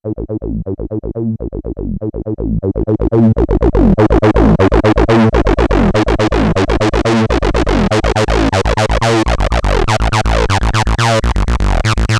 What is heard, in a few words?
Acid Bassline; Korg; Corrosion